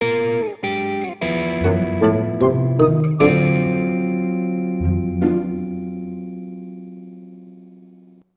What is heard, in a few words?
Bari Sax